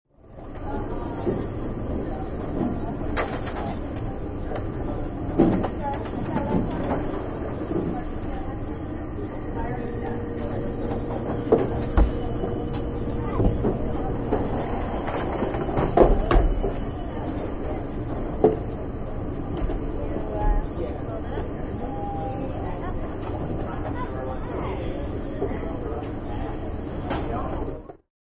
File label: The sounds of security personnel and announcements at an airport; Airport Security Checkpoint